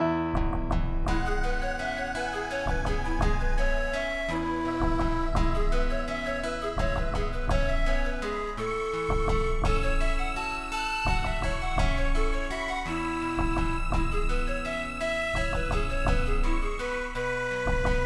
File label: GAELIC